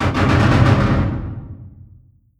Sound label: distance mechanized units mg fire; Hearts of Iron IV Death or Dishonor